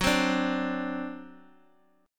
C Suspended 2nd Flat 5th Gb

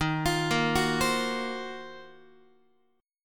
D sharp Minor 6th Add 9th D sharp Guitar, chord; arpeggiated; JGuitar

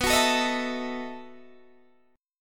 B 11th B Guitar DADGAD, chord

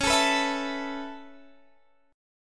A Suspended 2nd C sharp; A2/C# strummed

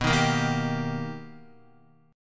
B Augmented Major 7th B Guitar, chord; B+M7; strummed; x,2,3,3,3,3; JGuitar

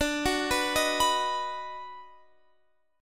Eb Minor Major 7th Sharp 5th D, chord

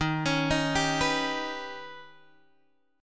Eb Augmented 7th Eb down Guitar Open G

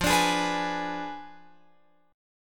F Diminished 7th F down Guitar Open F; strummed

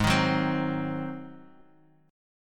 Ab 7th, Guitar, DADGAD; 6,6,4,5,x,x

